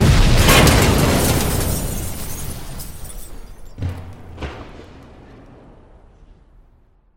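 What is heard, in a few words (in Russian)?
пугающий жестяной грохот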